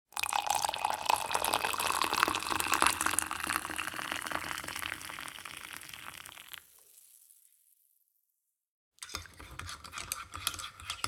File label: Coffee Pour And Stir